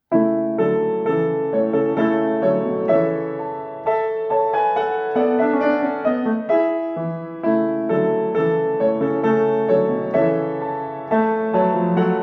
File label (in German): Klavier; Il est ne le divin enfant